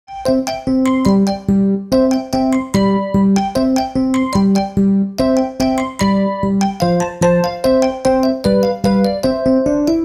snel

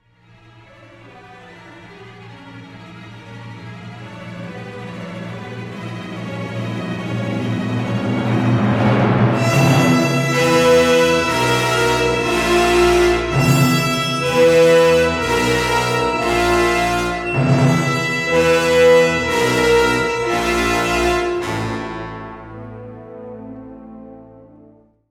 filmmuziek

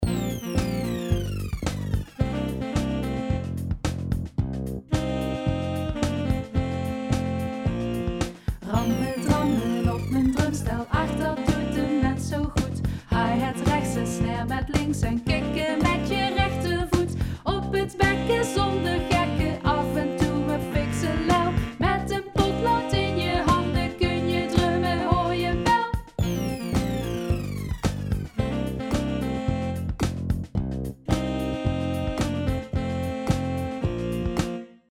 drumstel

